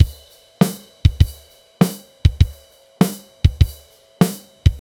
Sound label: soft drums loop recorded, studio